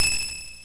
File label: blips, opl3